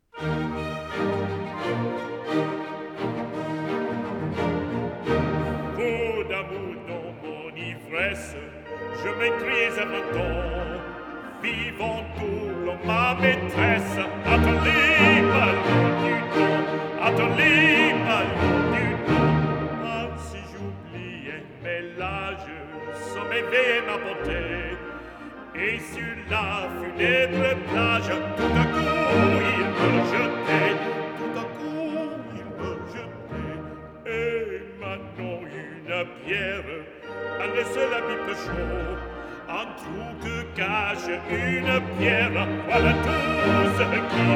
incidental music for soprano, baritone & orchestra